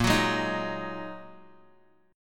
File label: Bb 7th Suspended 4th Sharp 5th, Guitar; Bb7sus4#5 strummed